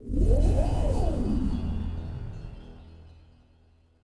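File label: force Super Charged Up; force powers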